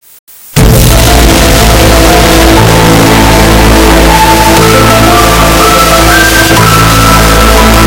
soothing music

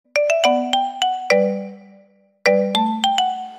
ringtone notification

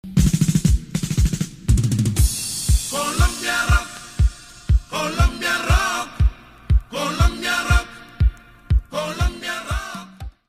rock

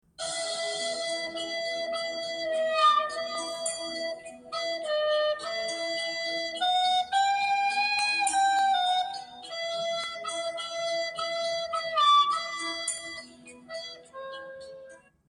FLUTE FAIL; TITANIC

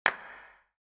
percussion clap single reverberant; artlistoriginal

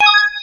correct

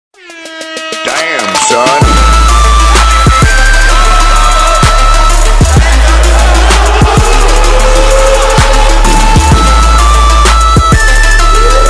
illuminati song trap remix; prod diamond music

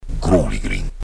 growly grin

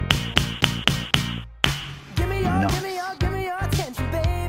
click nice music

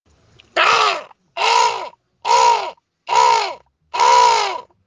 croweded

